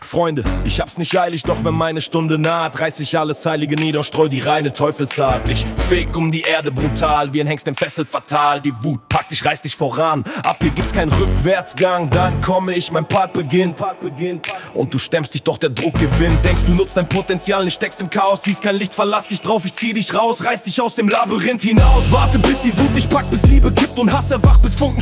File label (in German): Rap